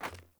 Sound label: Sandals Run Metal, Footsteps; Characters; Player